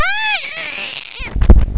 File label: death; faerie